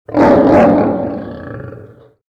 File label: lion howl